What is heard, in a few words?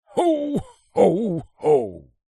human santa ho ho ho; zapsplat